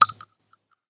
shell 12ga dirt; STALKER; IWP

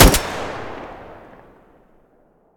groza muzzle stereo, weapons

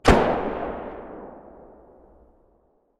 muzzle mono far, weapons

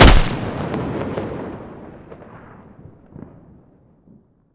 mg muzzle mono, weapons stationar

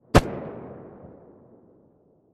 glock17 silencer mono distant

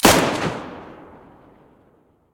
mosin muzzle mono, weapons

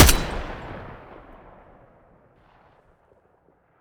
ak12 muzzle mono, weapons; STALKER; IWP

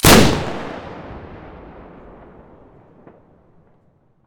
aa12 muzzle stereo, weapons; STALKER; IWP

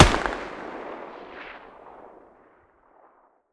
mg muzzle mono far, weapons stationar

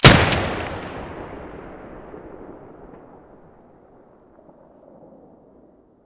muzzle beneli stereo, weapons; m1014

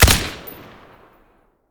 ak103 silencer stereo, weapons; STALKER; IWP

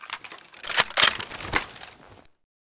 wa2000 misfire

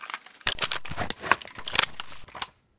reload; S.T.A.L.K.E.R.; IWP; vityaz

